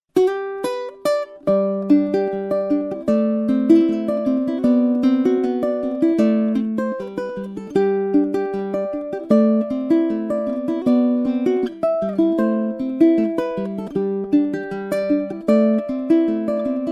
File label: Milo Tenor Ukulele